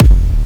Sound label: Dubstep Kicks